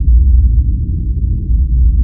hum loop; surface

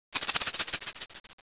snake

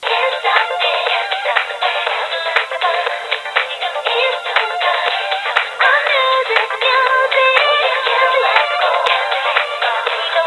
rr music right; korea

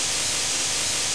amb steam; cruisecontrol

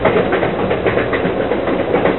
rail clack; agora

